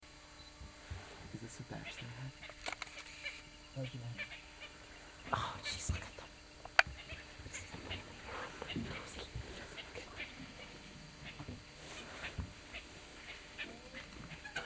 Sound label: elephant mud bath